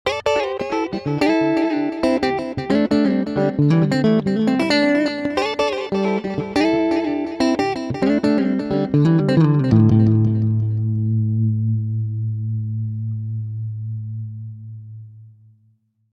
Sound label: delay, effets